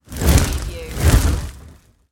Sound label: Fireball Pass By Heavy